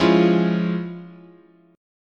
F Minor Major 7th Sharp 5th, chord

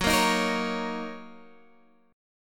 Fsharp 6th, guitar chord

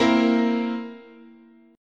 A sharp Suspended 2nd Flat 5th, guitar chord

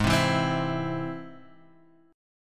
G# Augmented, guitar

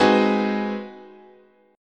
G sharp 7th Sharp 9th, chord, guitar

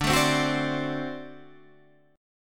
D 7th

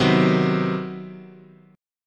C Minor Major 7th Flat 5th, chord

